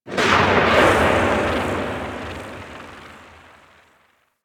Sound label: Corpus Ship Exterior Thunder Claps; Ambience; Warframe; Corpus Ship Remaster